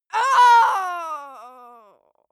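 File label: female death, screams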